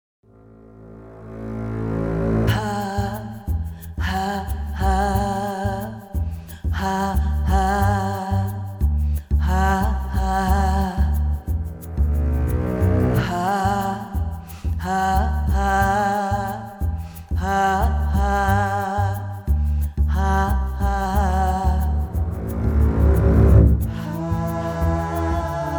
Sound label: INTRO BEAT